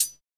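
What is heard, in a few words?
hi hat; Best Service Gigapack 2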